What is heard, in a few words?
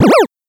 match